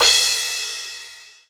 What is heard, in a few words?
Crash L